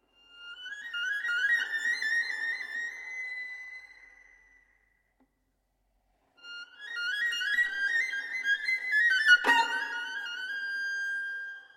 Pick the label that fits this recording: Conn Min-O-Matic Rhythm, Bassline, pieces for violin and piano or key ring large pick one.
pieces for violin and piano